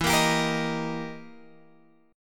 E Minor Major 7th Flat 5th, E, down, Guitar, Open G